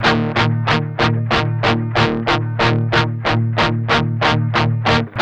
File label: MED ROCK 8TH E 3, Guitars; Spectrasonics; Hans Zimmer Guitars VOL-1; 102-MED ROCK